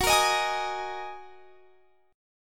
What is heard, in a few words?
Gb 6th Add 9th Gb down; Listen to Gb6add9 strummed